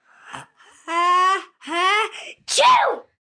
Sneeze Buildup, Sneeze, 1 child, long, dramatic, loud, human voice, kid, child; Sneezing; VoiceBox